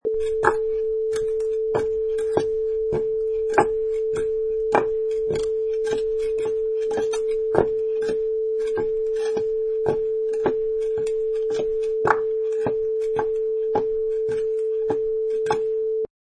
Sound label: Clogs on Concrete